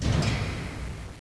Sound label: Connected; Myst2